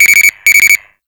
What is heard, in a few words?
Panel Alarm; Warframe; NineteenNinetyNine; Stage12PartyOfALifetime